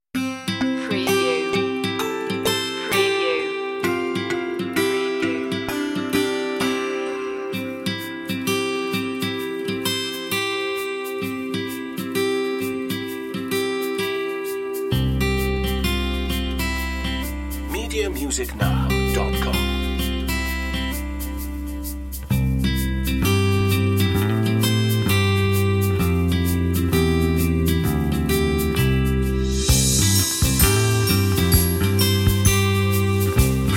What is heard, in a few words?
underscore